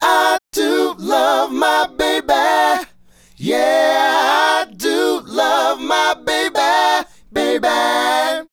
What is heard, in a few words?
Male Group, Hip-Hop and RnB Vocals